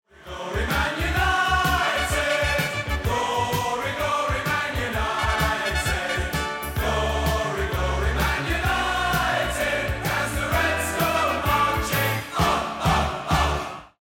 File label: won round; music kits custom